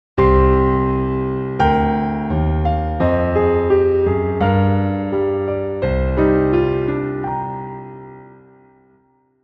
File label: game over